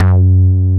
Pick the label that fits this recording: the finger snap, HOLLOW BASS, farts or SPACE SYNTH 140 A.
HOLLOW BASS